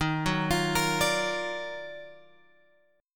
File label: D sharp Minor Major 9th D sharp, Guitar; D#mM9 Chord {11 9 X 10 11 10} arpeggiated